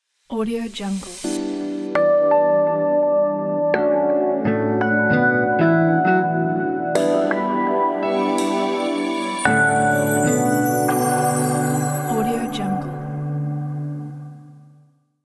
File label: night lounge ident; johnscofield